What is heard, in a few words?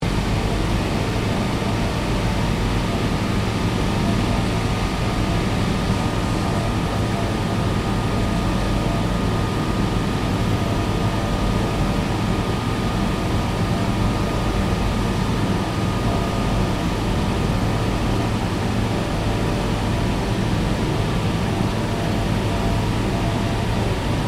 AMBIENCE INDUSTRIAL PLANT ROOM